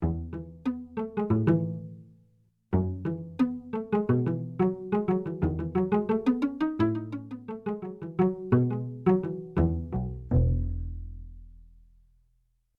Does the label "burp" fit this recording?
No